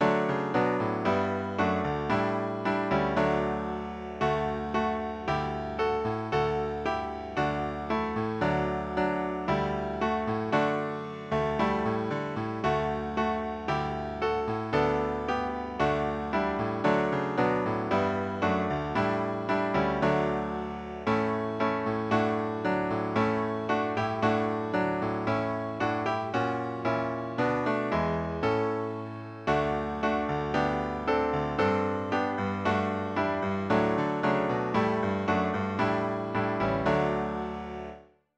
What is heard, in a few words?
Ode to Joy Piano; Essential Elements; 86 Ode to Joy